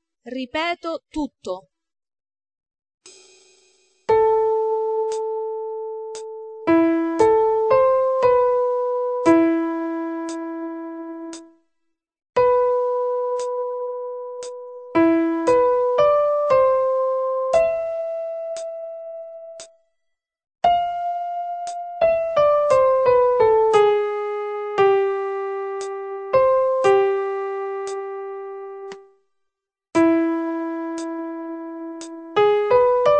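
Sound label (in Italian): Modulazioni Convergenti La minore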